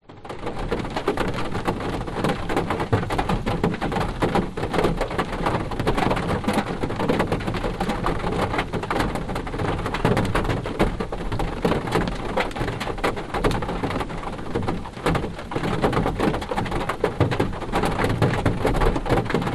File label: vehicles car rain and drips from trees hit car internal engine off binaural